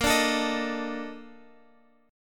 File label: A sharp Major 11th A sharp Guitar Half Step Down; A#M11